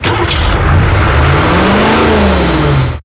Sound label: airstream